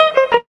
Guitar

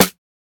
Snares

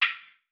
Trap Hi-Hat; Closed Hats

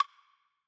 Wood, Percs

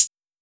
Hi-Hat; Closed Hats

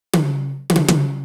tom fill 80; Percs

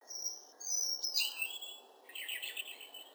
African black bird texture, FX

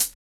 Percs

